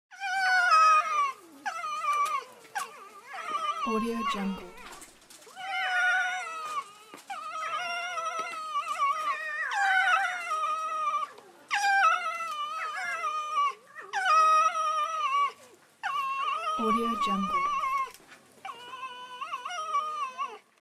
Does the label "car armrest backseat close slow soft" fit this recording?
No